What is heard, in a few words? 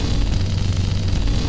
lightsabers idle cross; star wars; adv lightsabers